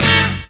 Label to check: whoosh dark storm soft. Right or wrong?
Wrong